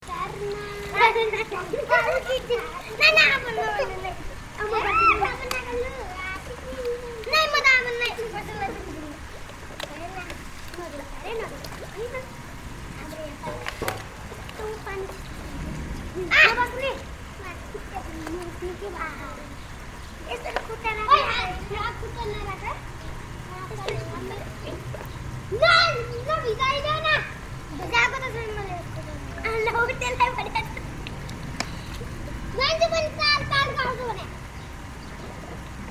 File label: Patan bottles, nepal